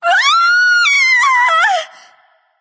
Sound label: fear; daji